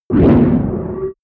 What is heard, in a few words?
whoosh distorted swish humming